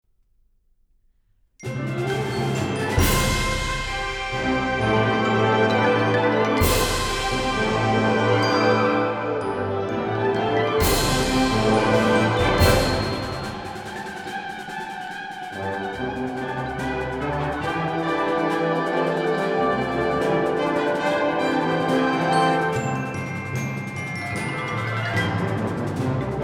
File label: Festive Fanfare